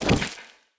movement step; smite; god odin

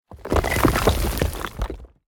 roll rubble; smite; god geb skin7

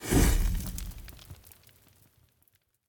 death flame; smite; pele

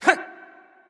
grunt; smite; xbalanque

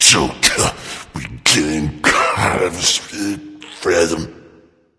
grumbling; cuchulainn

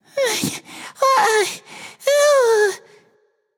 breath, voicepack; smite; jorm

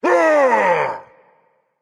battlecry berserker; cuchulainn

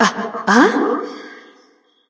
surprised light; smite; voicepack; hel

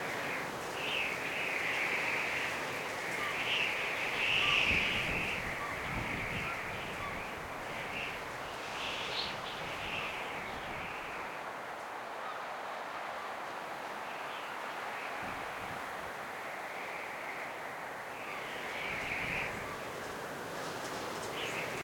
ambience coastal wind; smite

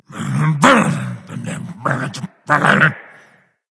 grumble; Smite; cabrakan

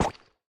movement step; smite; odin

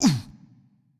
hitreact large; smite; roman archer

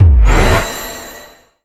darkness lead; smite; xbalanque

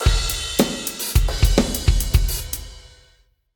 defeat drums; smite; raijin

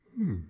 lobby victory cyclops vox; smite; osiris

